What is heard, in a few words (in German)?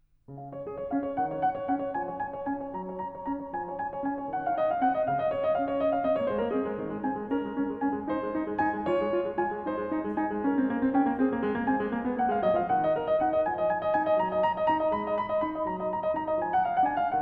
Praeludium Cis-Dur; Bach; Das Wohltemperierte Clavier; Andras Schiff; ECM